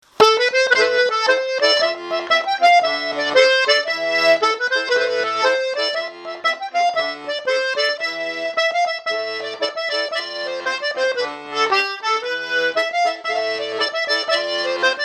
Ronde du Quercy

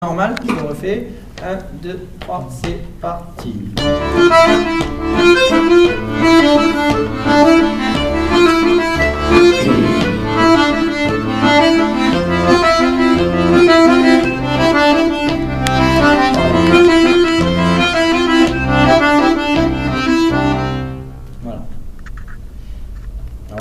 Amelie Version normale arpèges rapides; Valse

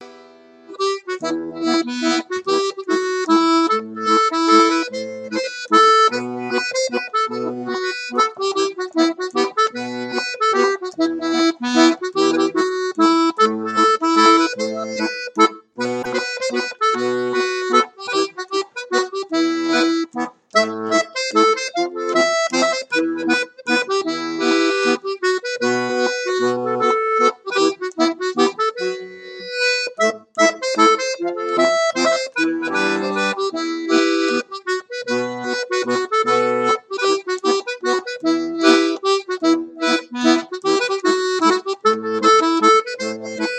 Mazurka; Rondeau 2021; La damisèla de la plaça d'Usès

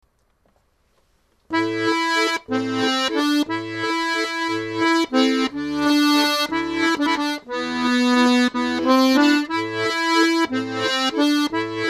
2eme voix; rencontres-2010

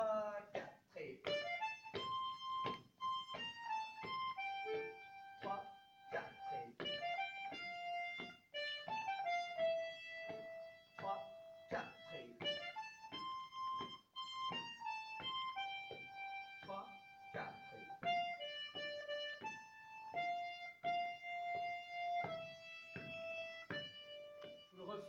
flutes

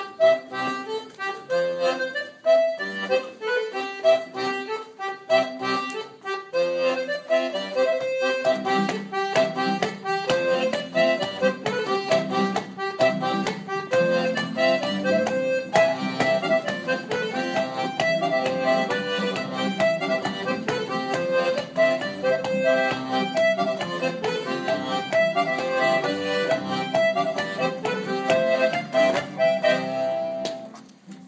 Polka; Finish set

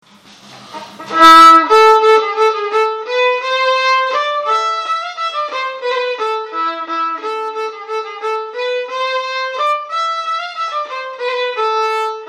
violon; Rondeau; Trignac 8

